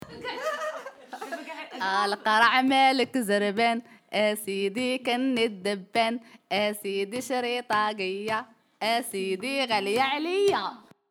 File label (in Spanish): Canción niños